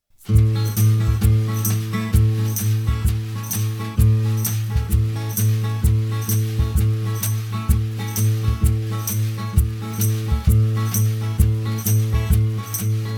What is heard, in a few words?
no vocals